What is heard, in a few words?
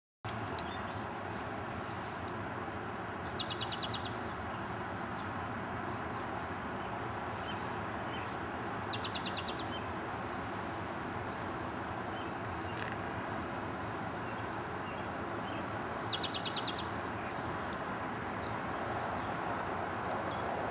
Towhee song